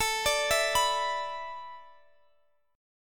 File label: A Suspended 2nd Suspended 4th, Guitar, Open F; Asus2sus4; arpeggiated; x,x,21,21,19,18